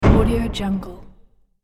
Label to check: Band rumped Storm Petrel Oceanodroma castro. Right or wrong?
Wrong